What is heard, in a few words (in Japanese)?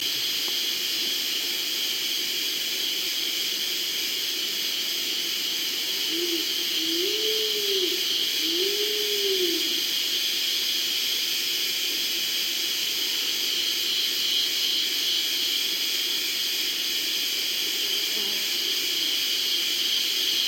karasubato